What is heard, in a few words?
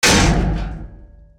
metal box